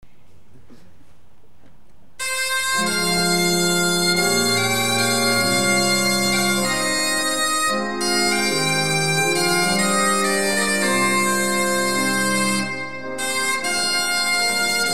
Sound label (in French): Orgues et Bombarde